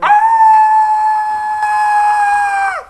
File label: annoying Noise